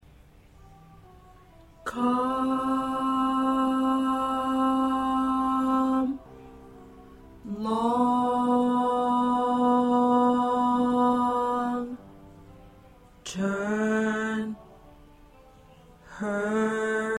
Tenor

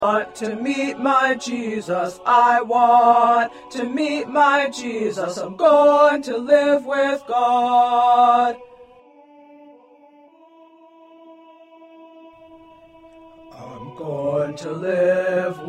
Bass

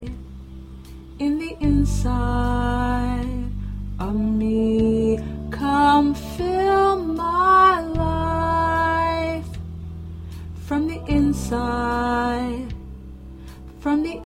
Lead 1

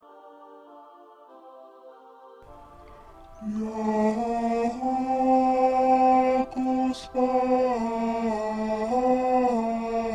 Bass 2, sacred classical pieces; part preview

